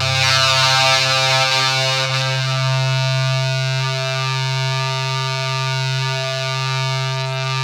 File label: ZWEEP, Synthy Tonal; Distored Reality